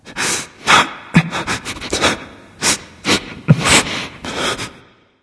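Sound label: nonverbal boohoo; smite; voicepack; ullr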